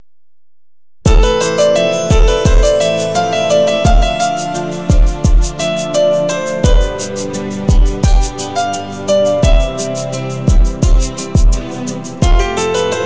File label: piano arab; Electronica